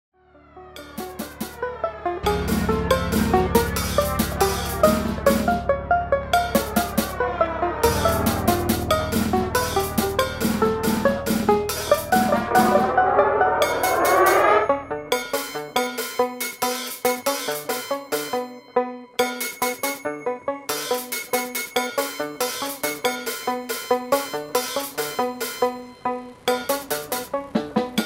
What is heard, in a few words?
banjo